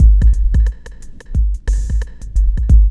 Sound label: LOOP, DRUM; E-Lab; X Static Goldmine 2